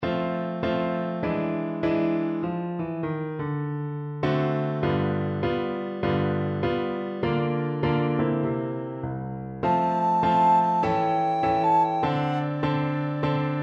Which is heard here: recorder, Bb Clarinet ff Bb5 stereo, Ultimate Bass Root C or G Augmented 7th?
recorder